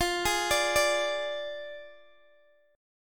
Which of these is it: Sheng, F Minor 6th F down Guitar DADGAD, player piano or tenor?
F Minor 6th F down Guitar DADGAD